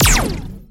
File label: Gun